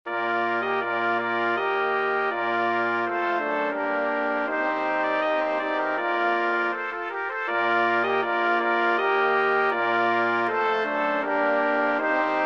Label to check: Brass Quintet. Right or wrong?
Right